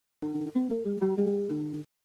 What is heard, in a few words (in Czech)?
znělka zvuk; arfa